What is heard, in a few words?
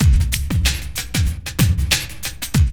LOOP; E-Lab; X Static Goldmine 2